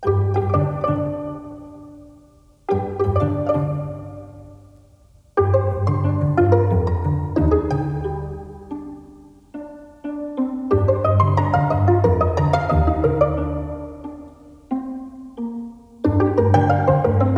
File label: quartet pizzicato; young creation award